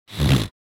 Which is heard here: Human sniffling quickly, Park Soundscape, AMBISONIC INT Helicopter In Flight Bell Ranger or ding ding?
Human sniffling quickly